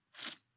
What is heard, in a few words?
Collect; Malbers Animations